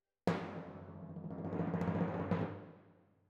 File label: Timp Close